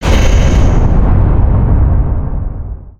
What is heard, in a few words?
pounce; creatures organic